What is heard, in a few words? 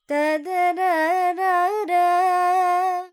Dorian, Vocals Carnatic Female Alaps 70 G Dry